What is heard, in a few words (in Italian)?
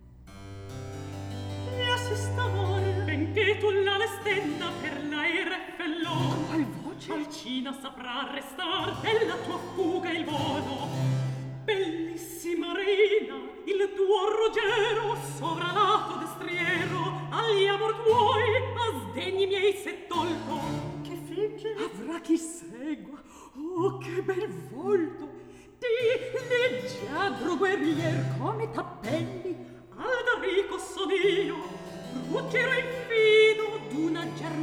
Recitativo; Atto III; Scena 3; (Alcina, Ruggiero, Bradamante)